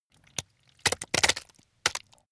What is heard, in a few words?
intestines slip